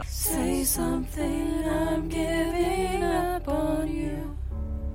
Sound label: karaoke